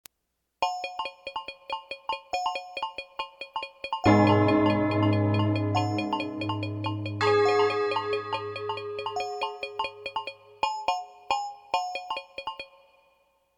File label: SY99 clockwork